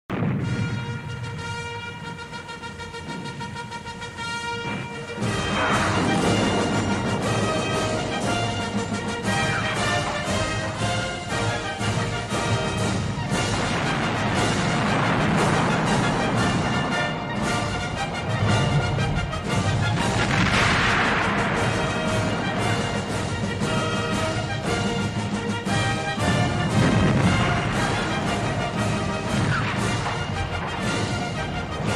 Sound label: Ancient army